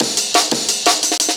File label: 60 175, Breaks; Drum&Bass; EZ Rollers